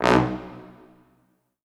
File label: Orchestral Brass